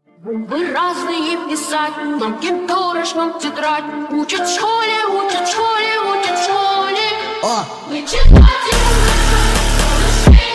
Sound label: BRAZILIAN FUNK